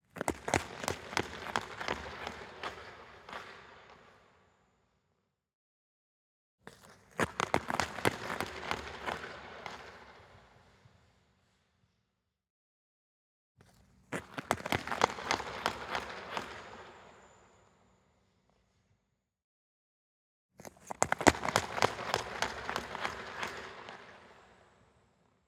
ICE HOCKEY skate start away from stopped fast x 4, medium; Sun-Smile-Island